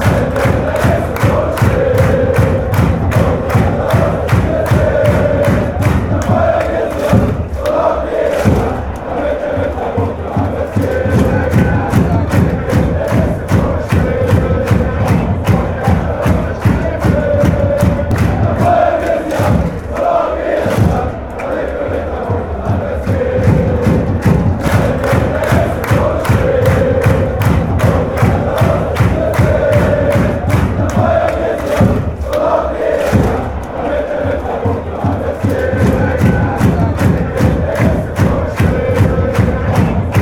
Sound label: chant, fan chants; eintracht frankfurt